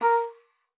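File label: A#4, oboe d'amore; Xsample VOL-7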